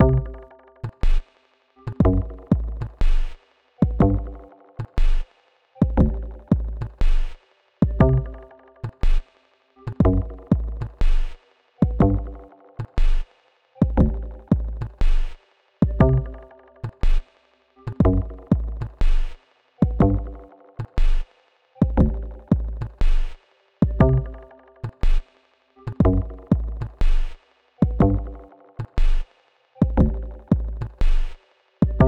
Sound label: Dark Gloomy Hiphop Beat